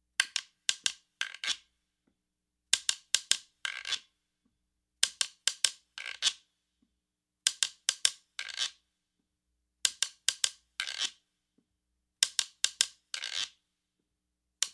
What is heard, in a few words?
Guirro Fish Latin Percussion Instrument Rhythm Sample 100 BPM